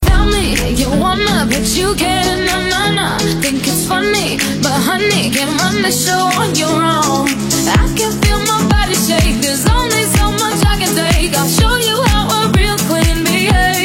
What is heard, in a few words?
Slap House Sumer Bass